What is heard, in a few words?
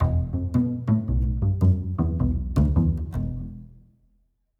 pizz close; eastwest qlso